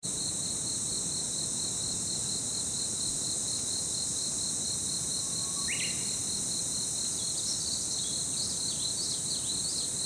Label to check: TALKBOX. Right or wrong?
Wrong